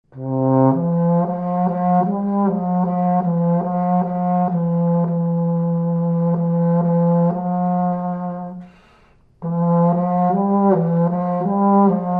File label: serpent